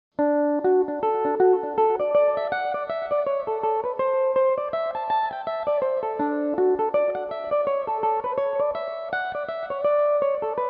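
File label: D major, Irish mandolin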